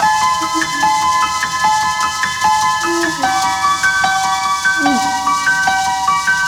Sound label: Combo Melody, Cinematic Funk Urban Tone Poems; Sonic Foundry (Sony Creative Software)